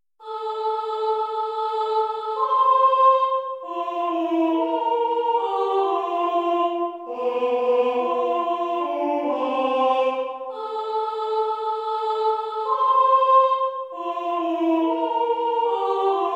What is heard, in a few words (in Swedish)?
sopran; Släpp fångarne loss sopran; valborg 2018